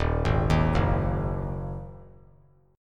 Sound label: D sharp Minor Double Flat 5th; arpeggiated; chord